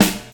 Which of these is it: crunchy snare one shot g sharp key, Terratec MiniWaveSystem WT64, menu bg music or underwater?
crunchy snare one shot g sharp key